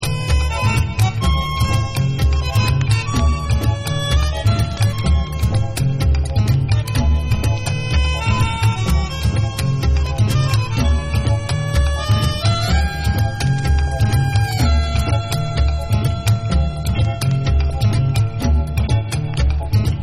Acoustic Mix

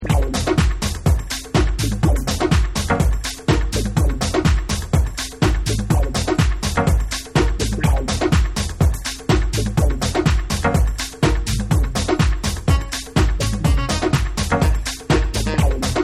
Dub Instrumental

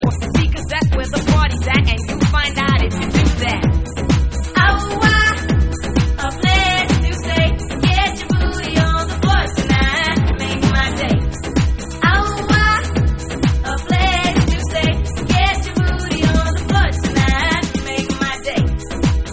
Vocal

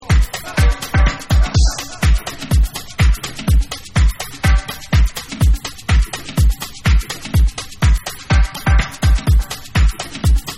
Deep Drums

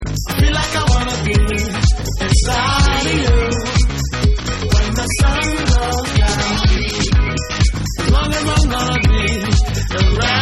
Reggae Rock Mix

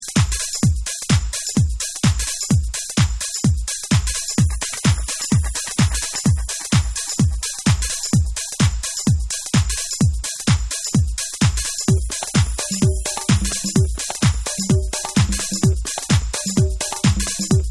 PEEPING TOM Peeping Beats

